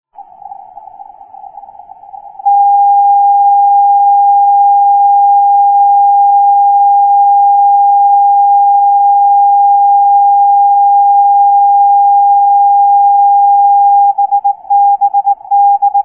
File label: Beacon CW